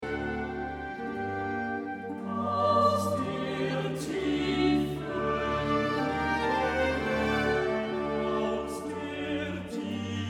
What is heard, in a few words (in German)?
Bach Aus der Tiefen, Tenor